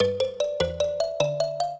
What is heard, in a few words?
trio, mbira; Mozart